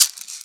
IN SHAKER; Ragga & Dub Reggae

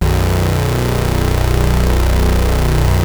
Prophet 5 B1, Synthesizer; Hollywood Edge - Giorgio Moroder Rare Synthesizer Collection

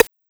808 Ri; Roland MV8800; Dirt&GrimeKit

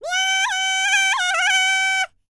BLACK SEA, World Voices; ILIO; Vocal Planet VOL-5

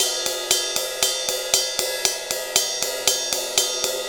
Ride Cymbal Pattern

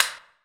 clap stick

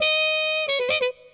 Horn Loop

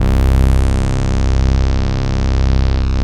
DW8 BRS C1, Korg; Back In Time Records; Korg Universe VOL-1; DW8000

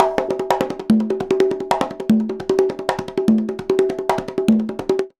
CONGA, Modern Percussion Loops

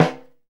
SNR LO RIM; Zero-G; Funky Elements

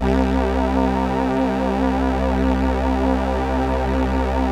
LAMBDA, String Machines

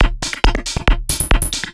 percussion loops, Techno, 138; PS-45 WAV Techno ID

